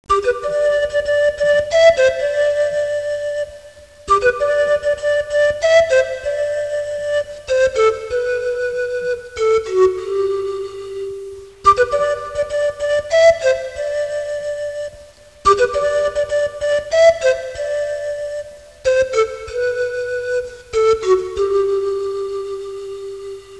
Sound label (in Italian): musica strumentale